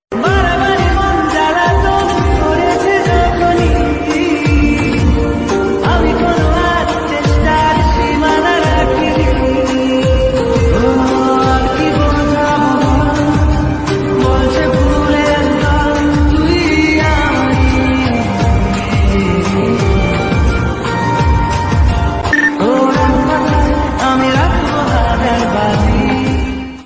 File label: Bengali Romantic Ringtone